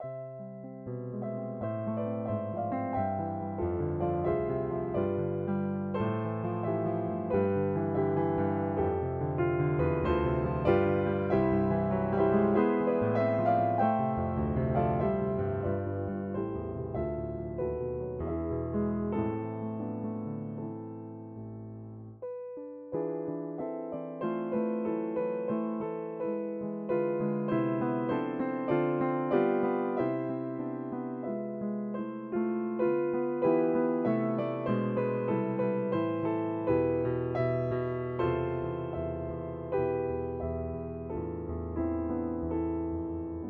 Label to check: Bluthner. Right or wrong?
Right